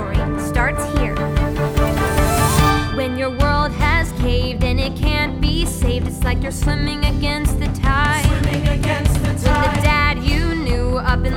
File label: Guide Vocals; Audition Cut